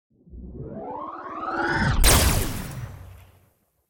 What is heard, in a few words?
Sci-fi weapon neutron cannon hitting